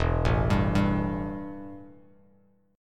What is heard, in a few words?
E Minor Double Flat 5th E, Bass Standard; arpeggiated; JGuitar